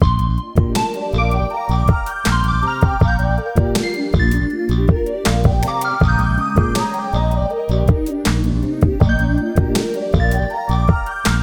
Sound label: Tone